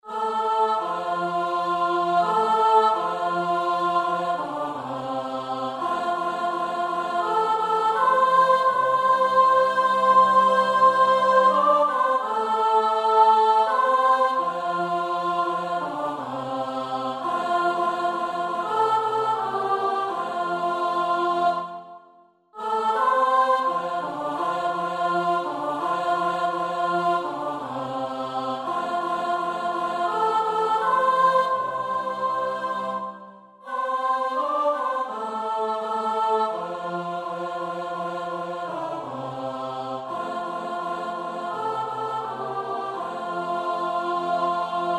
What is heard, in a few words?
Swing Low SOP